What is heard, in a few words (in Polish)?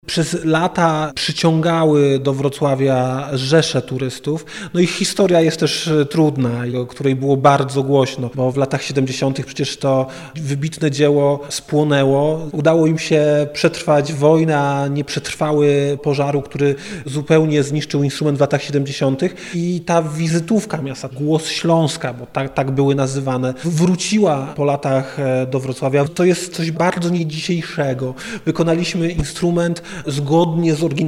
organy; koncerty organowe w kościele św. Elżbiety